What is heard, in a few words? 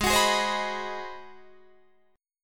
Ab 7th Sharp 9th Guitar Open D, chord